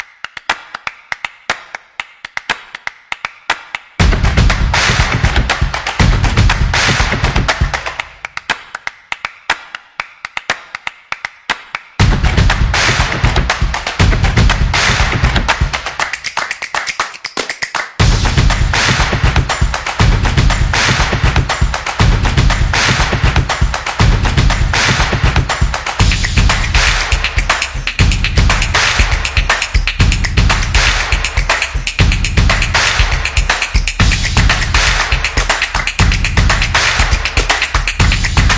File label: Commercial Background